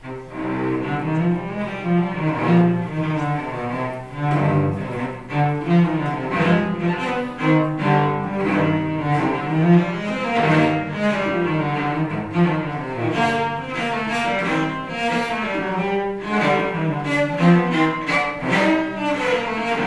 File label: Cello Suite in C minor; Cello Suite No 5; Bach